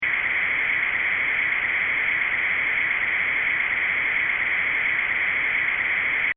Sci fi ambience loop, Scifi Ambience: Short Looping Element